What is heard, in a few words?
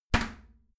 toilet lid close regular; haus-garten; badezimmer